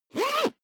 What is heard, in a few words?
zipper backpack close fast